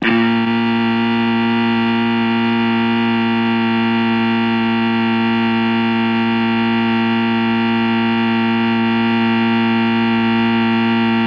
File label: Solenoid Engage Buz, buzz & hums